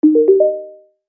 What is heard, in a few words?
Digital Notification